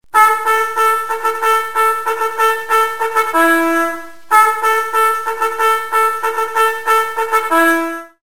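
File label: bugle charge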